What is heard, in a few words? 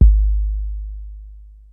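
HIPHOP KIT, DRUMS; Kid Nepro; Elite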